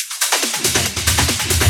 Loops Fills; Vengeance Samples

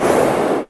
VFX Short Fills & Reverse

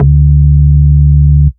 Bass D, Root D

